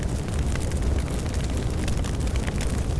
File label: campfire large loop; svencoop; keenhalloween